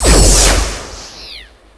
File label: Gravimetric